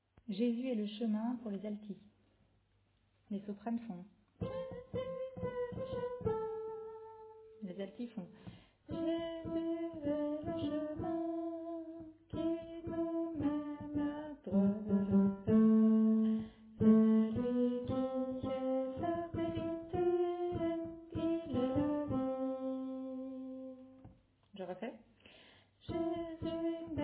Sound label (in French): Alto